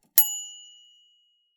Royal Ping

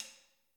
Prac Perc

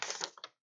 Page Turning

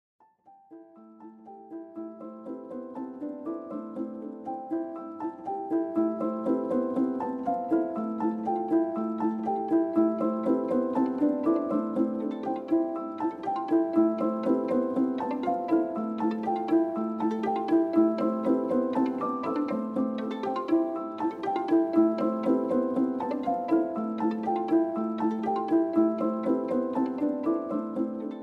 Dreamlike Piano And Strings Soundscape

